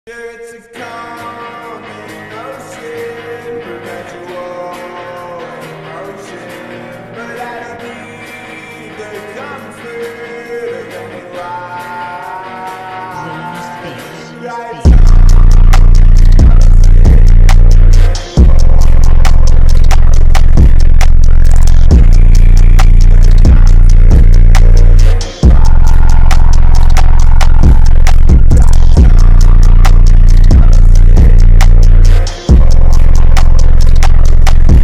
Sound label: Beats bassboost